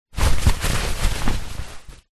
foley clothes running shorts polyester movements handling